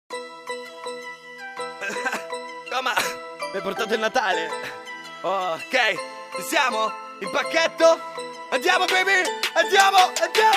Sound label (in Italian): BUON NATALE TRAP